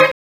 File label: Stab; 'Go Fuck Yourself' samples; lolicore